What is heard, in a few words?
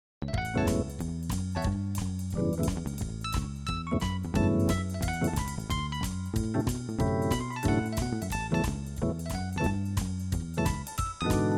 MIDI blues